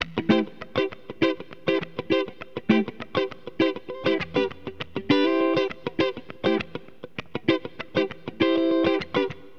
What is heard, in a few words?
FUNK GT, Guitar; LiveAct Pool 1; AcidJazz Funk